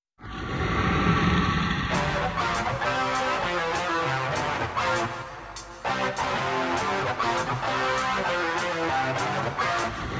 sms tiger ringtone

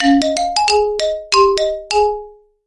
imo ringtone

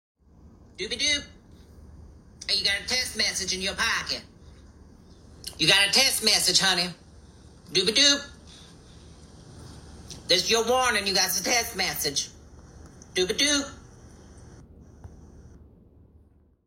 notification ringtone; snapchat notification; android

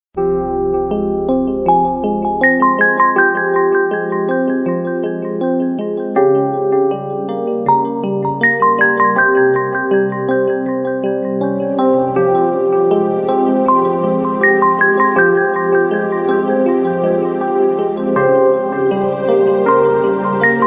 nice old ringtone

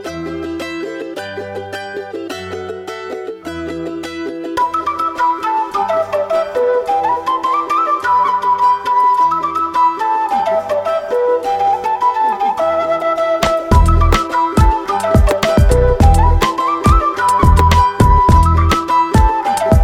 flute ringtone

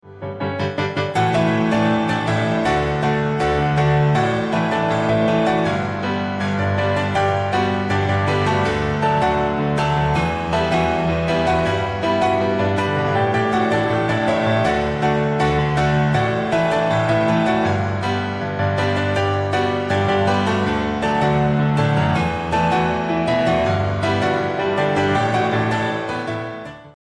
piano ringtone; ninjago

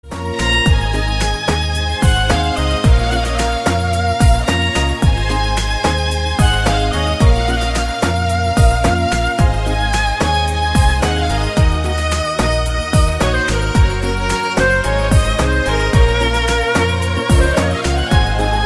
violin music ringtone; android

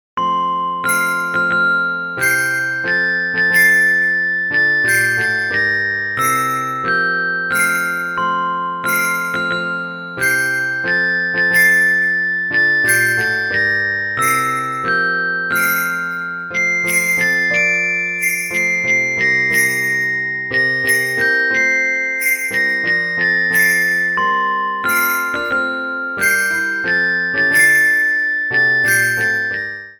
christmas tree ringtone

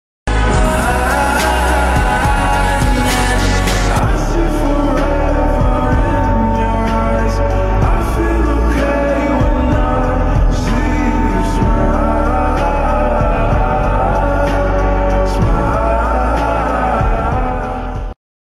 slowed ringtone

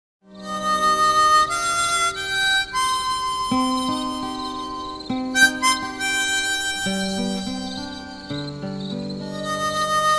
harmonica ringtone; android; sholay

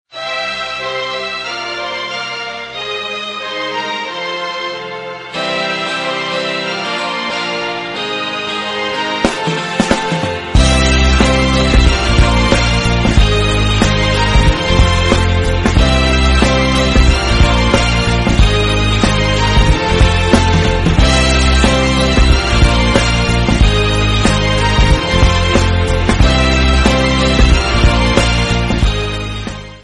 sony ringtone

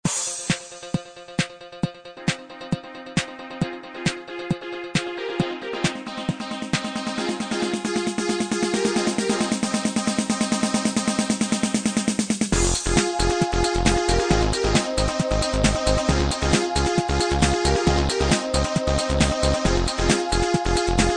motorola music ringtone

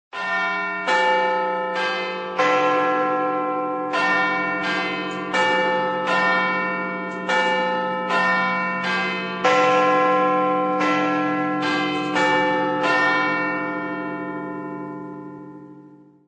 big ben clock ringtone

